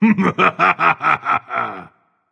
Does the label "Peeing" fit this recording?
No